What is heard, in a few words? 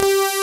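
SYN SAW BASS; Pro-Rec - Synthsonic; TRINITY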